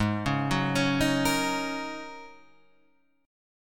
Ab 7th Suspended 2nd Suspended 4th, Guitar, Half Step Down